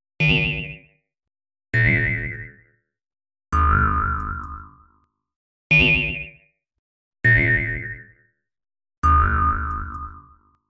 Boing; Juskiddink

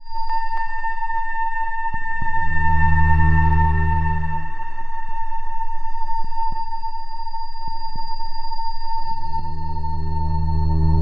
Scary scape Voice string calling

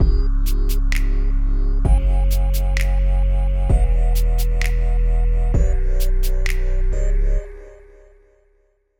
Slow Groove

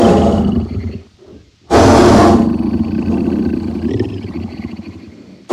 Angry Tiger Fantasy

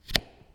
Switch

